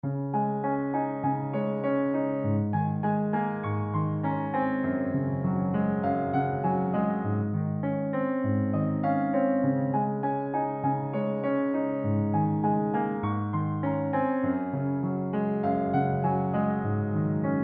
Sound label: bechstein, Piano Plugin